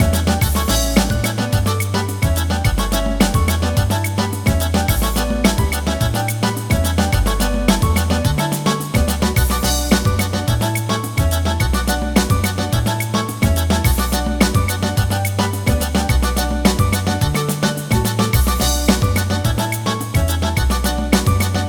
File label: qchord salsa